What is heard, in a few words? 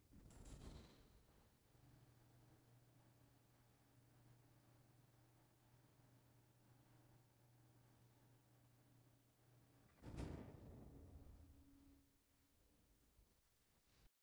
broken window hall; SFX Engine